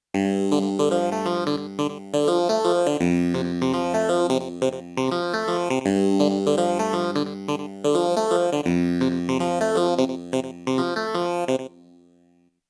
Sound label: Clavichord Sound